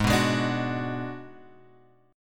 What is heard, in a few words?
G sharp Minor 7th Flat 5th G sharp down Guitar Open G